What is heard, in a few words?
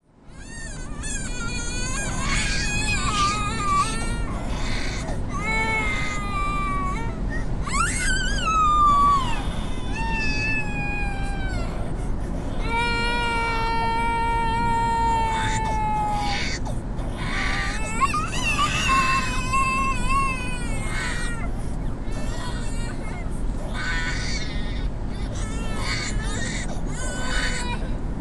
two crying kids in the car